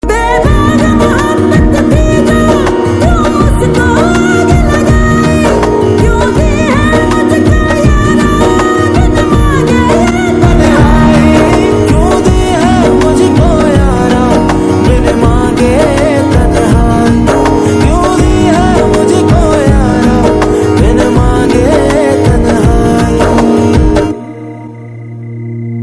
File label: OST pakistani ringtone